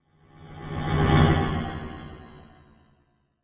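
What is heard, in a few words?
Hover Craft By Medium, spaceship fx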